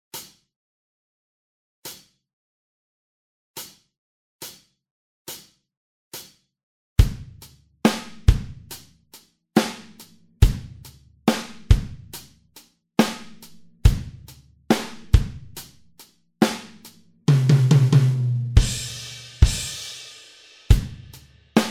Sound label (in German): Der 2000 Tonnen Gueterzug 1. Stimme (langsam), Trommelfeuer